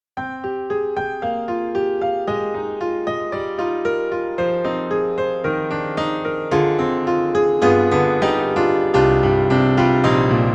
Model D Close; Steinberg; The Grand 3; Satie's Gym-nopedies

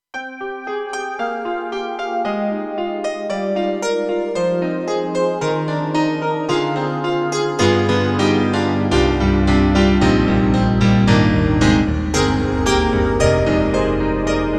KORG Snowy Piano, TRITON-Rack; EXB-PCM08

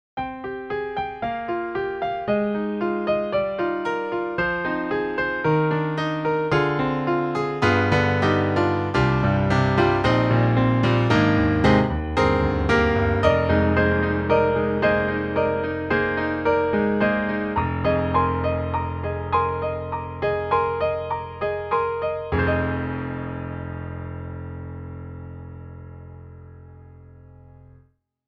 Live Grand Piano; Steinberg HALion One

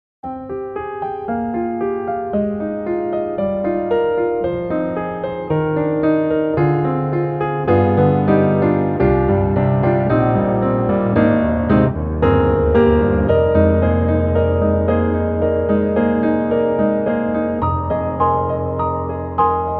Custom C7 Cinematic; Spectrasonics; Keyscape

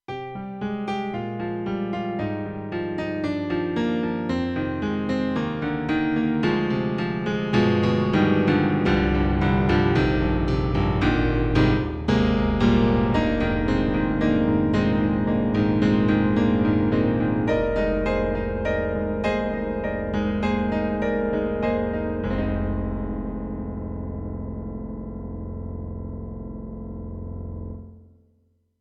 Korg X3R Piano 16'

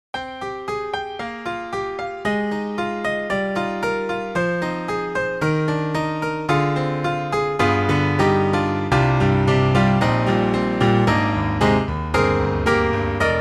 warm grand, piano; IK Multimedia

